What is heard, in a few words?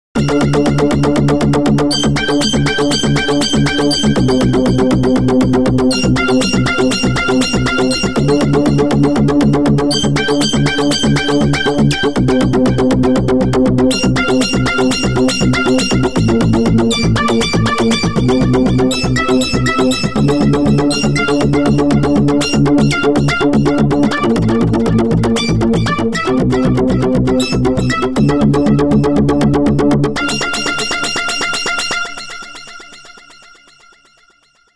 Pure Tecnho